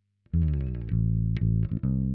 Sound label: bass; musdb18